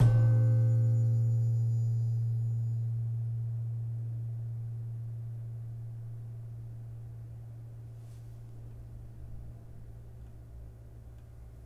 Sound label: Slentem, gamelan; gravityharps; timbrebox